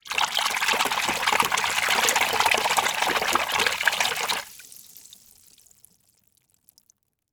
Liquid Water Movement In Plastic Bucket Finger Swirling Rapid